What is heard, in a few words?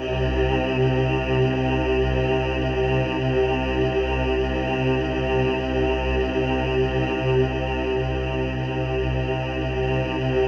C2, Synth Leads